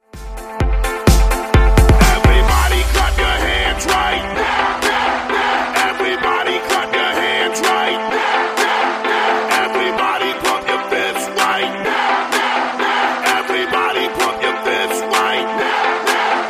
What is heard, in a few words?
club hype